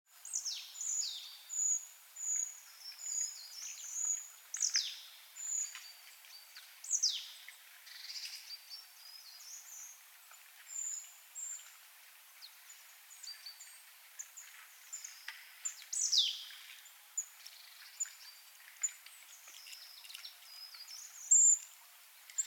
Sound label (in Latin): Poecile palustris; SETE